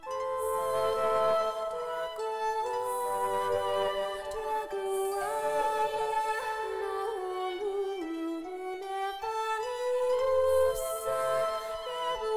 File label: Disto; intermodulation